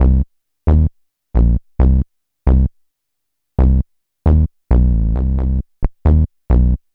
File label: Bass, Futurist Drum & Bass